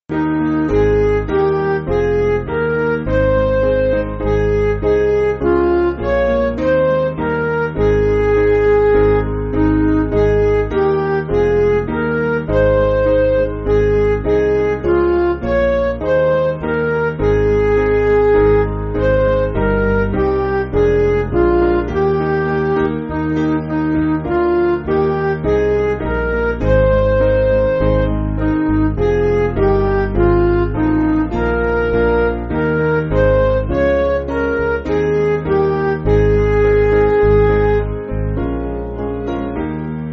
Midi, Music Backing

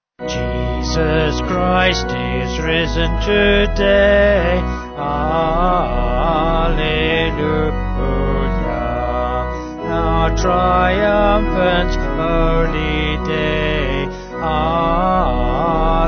Jesus Christ Is Risen, Vocal Organ; 3/B; Sung Lyrics

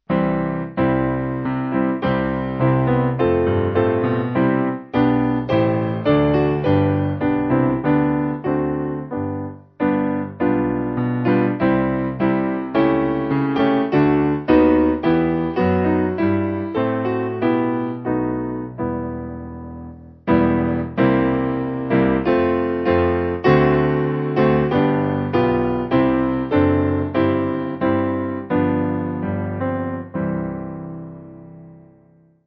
Piano, backing

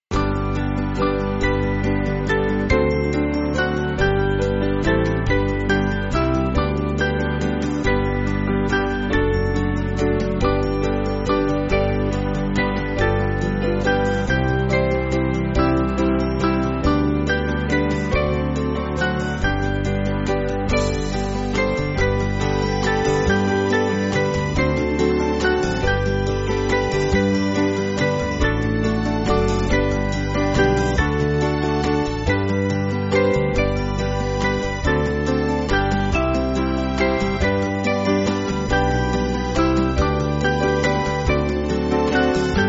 Band

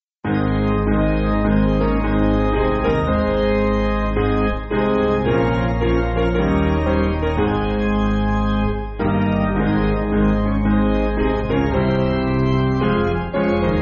Piano, Music Backing; O Zion Acclaim; Ga Sion

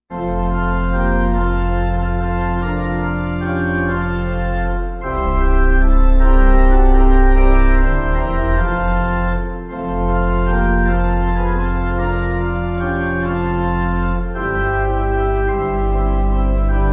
Give Me Thy Heart, Pipe; Organ; Kirkpatrick

